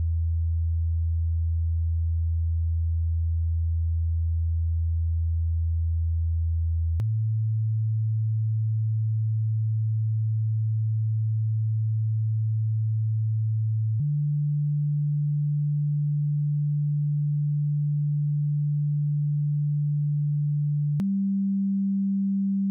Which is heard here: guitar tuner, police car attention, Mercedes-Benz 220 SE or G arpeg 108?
guitar tuner